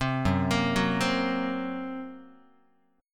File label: F sharp Major Flat 5th F sharp Guitar; F#Mb5 arpeggiated